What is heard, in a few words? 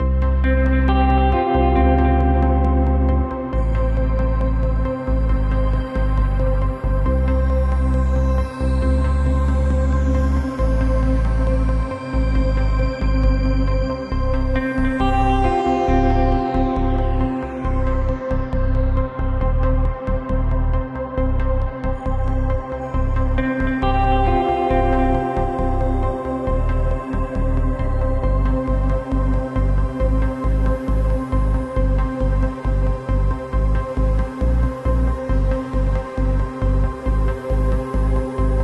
Base Music Loop; Action Ops Snow And Sable